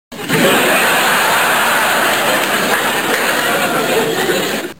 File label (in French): Rire